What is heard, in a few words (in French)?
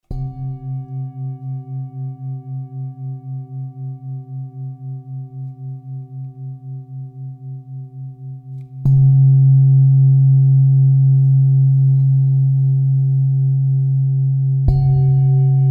bol enfant